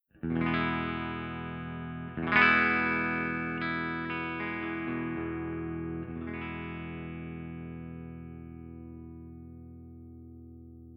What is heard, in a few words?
FENDER TWIN STANDARD